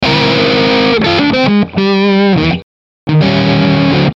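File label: Musical Element, Drum Snare March; Blastwave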